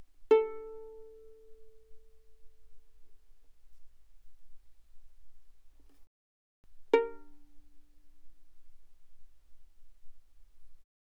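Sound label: Violin pizzicato mezzo forte sul A A4 B4; Strings; violin2012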